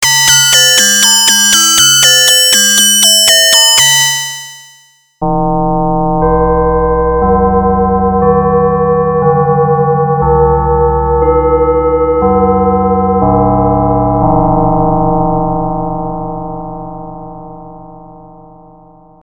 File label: linbells